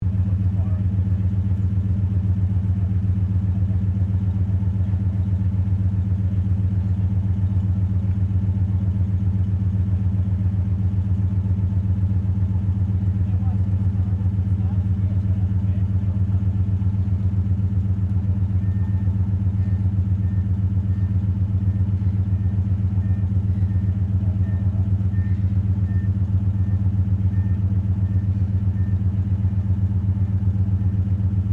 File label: Truck Ford 1300 weight 1941 idle exhaust; Classic commercial vehicles; Trucks